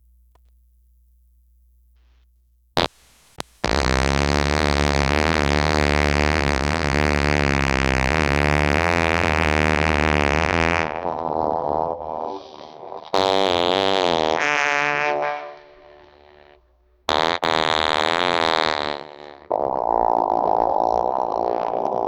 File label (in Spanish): pedo, fart; pedo de 47 segundos tiene